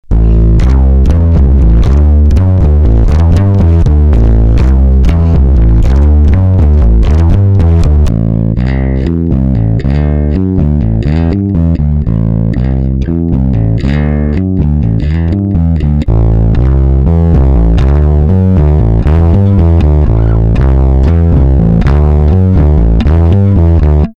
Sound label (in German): DI Gain voll Charvel